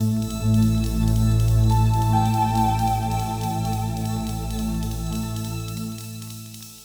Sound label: SPACE PAD 140 A; SpaceSounds